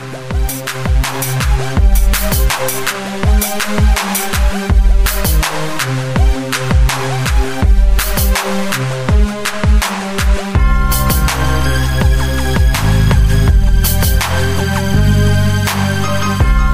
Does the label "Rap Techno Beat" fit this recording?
Yes